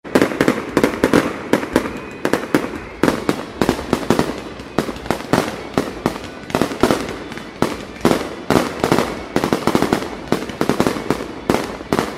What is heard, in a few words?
Ambience Fireworks